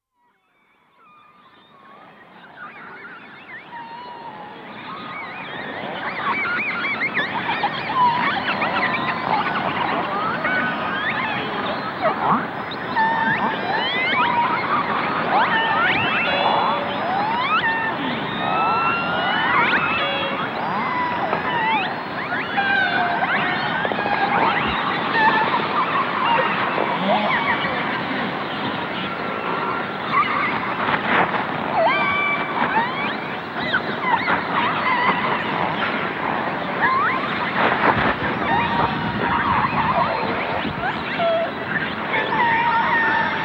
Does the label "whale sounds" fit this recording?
Yes